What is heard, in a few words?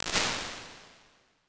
Studio Room; Medium Spaces; Apple